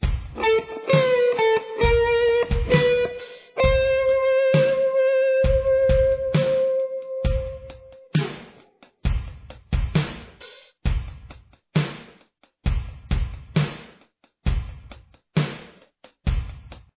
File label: intro lead